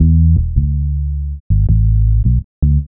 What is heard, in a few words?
Bass, Futurist Drum & Bass